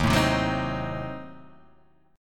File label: D sharp Diminished 7th D sharp